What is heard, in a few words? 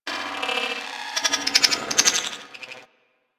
Beep Stutter; Warframe; Ambience; Corpus